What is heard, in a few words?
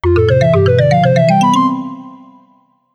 win; Anchoreum